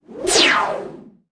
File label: Death Blow